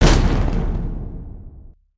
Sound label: charge